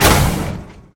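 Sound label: noisy sound of flamethrower activation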